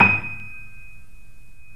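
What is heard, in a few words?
D#5, Bösendorfer, 3D Pianos; E-MU Producer Series Vol. 5; 3-D Audio Collection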